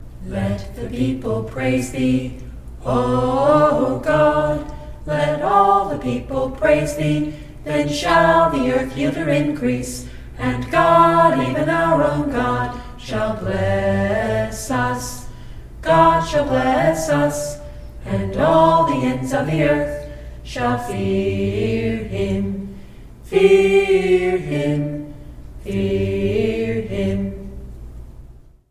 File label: a capella scripture song; psalm 67 5 7